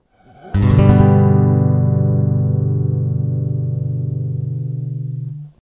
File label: code g, guitar